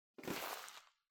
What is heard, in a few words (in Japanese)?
ゴム手袋を取る音